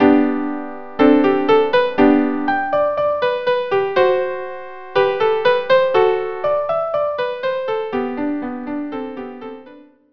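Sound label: violin viola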